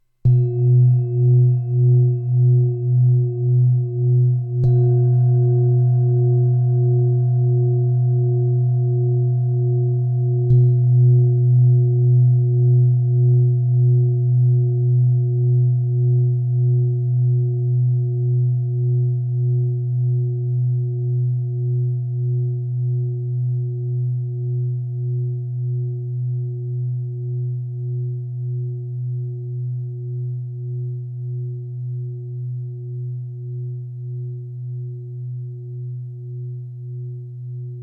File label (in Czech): tibetska misa; tibetska misa 24cm; matna